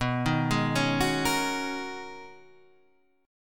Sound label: G sharp Minor 11th B, guitar chord